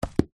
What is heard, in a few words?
household book cub scouts record small close; zapsplat